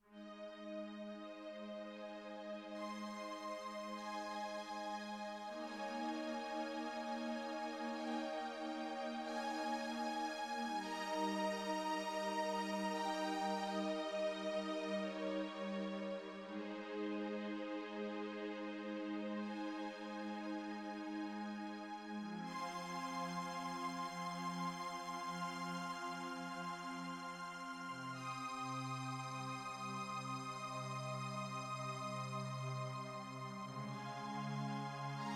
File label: Strings